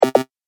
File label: Retro Gaming Sound; Video Game Sound Effects